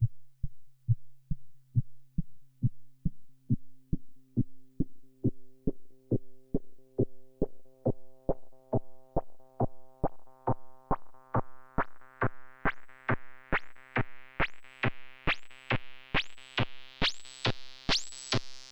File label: mirror music